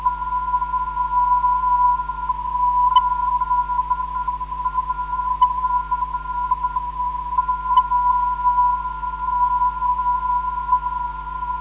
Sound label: MB 366kHz